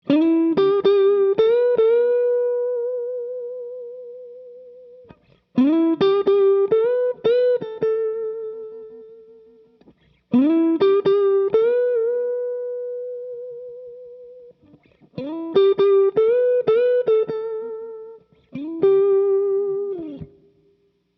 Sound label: Neck Middle, pickups